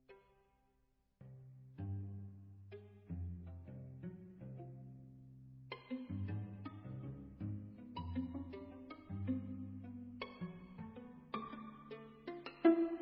TRIO FOR STRINGS

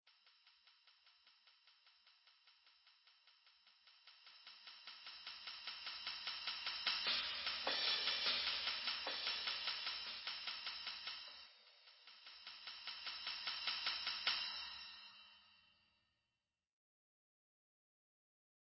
DUET FOR PERCUSSION

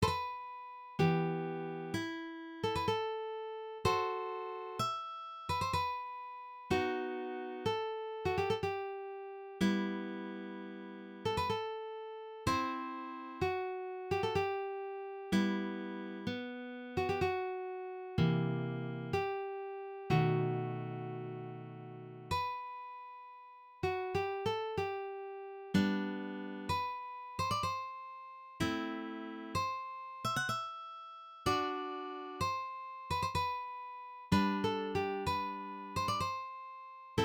guitar

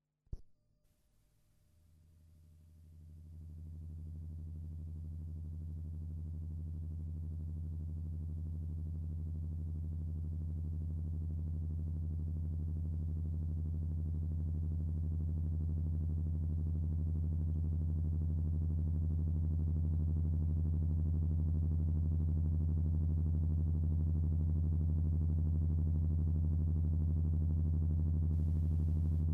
spoudi for 3 synth

